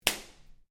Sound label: Whip Crack Belt Strap; Foley sounds for melee weapons; Objects